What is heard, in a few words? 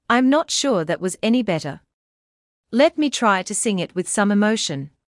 Singing